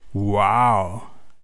wow sonido no funciona

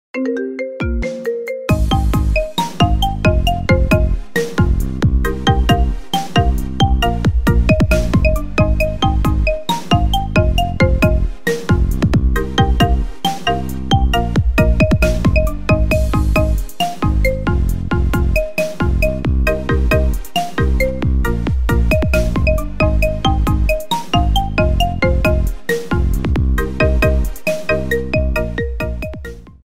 Marimba, tono de llamada

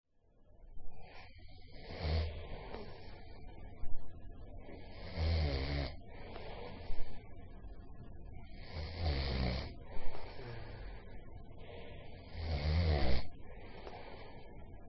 dormir ronquidos

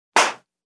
ataque violento

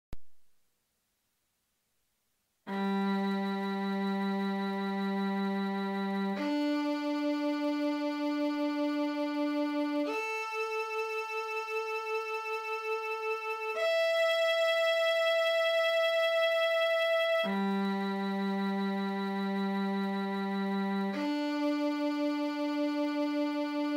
violin afinado

